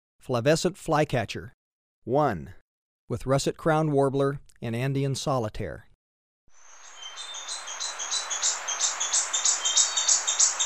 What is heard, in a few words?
Flavescent Flycatcher, Birds of Ecuador; S. America